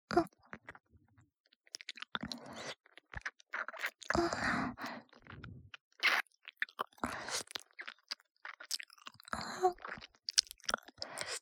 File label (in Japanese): 耳舐め 左, ループ音声